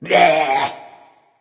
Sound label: tongue; cernunnos